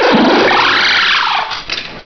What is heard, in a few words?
cry; source of the sovereign